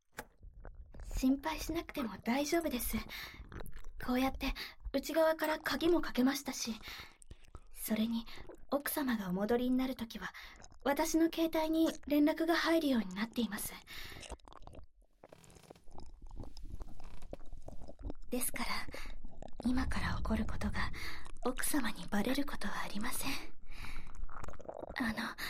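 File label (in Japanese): 耳舐めされながらキス・手コキ・乳首舐め・フェラ・素股され、・立ちバックする音声; どすけべウェディングプランナー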